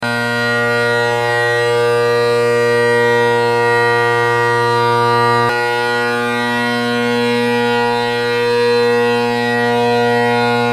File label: Canning or Cane tenors, Bagpipe; Naill, Robertson, then Sinclair with Canning tenors